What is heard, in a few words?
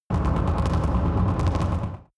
extrinsic buzz, energie